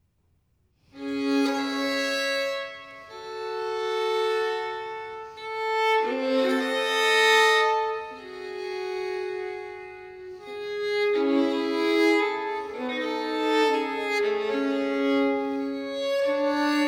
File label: Partita in D Minor, Violin Solo; Partita nr.2; BWV 1004; Bach; Sonatas & Partitas for Violin Solo